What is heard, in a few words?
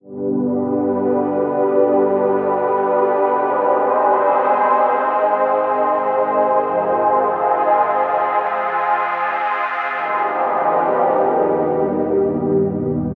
chord loop 146 G ocean